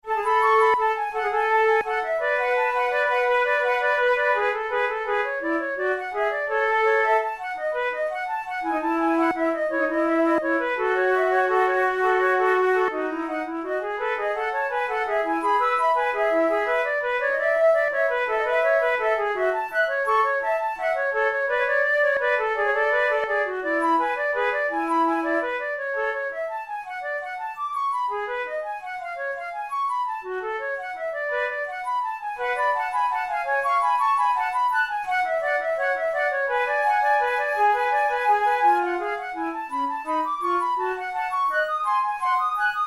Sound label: in a major, flute